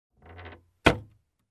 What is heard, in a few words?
Creaky Wooden door close